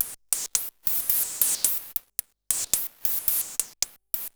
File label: MiniBrute 110BPM, Gated Seq Noise; noise hiss crackle